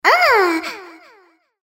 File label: moan; Imperial Girls